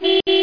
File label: CAR HORN